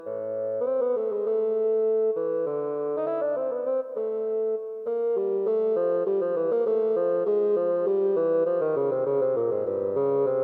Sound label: Just the Bassoon